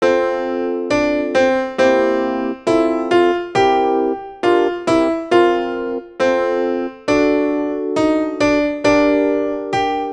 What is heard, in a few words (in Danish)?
sopran; Du som har taendt